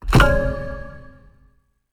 place stone

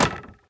Wood Arrow Hit

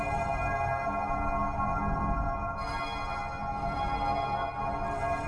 Warmhall Loop; Warmhall Loop 2

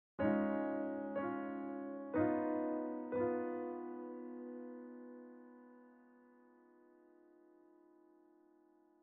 Piano; Unsighted

